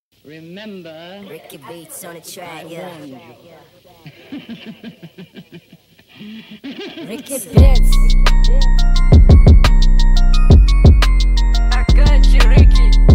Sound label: Instrumental; Prod. RICKEYBEATTZ